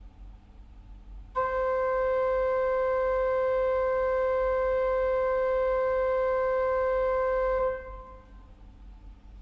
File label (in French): doublette, Orgue; Ce petit orgue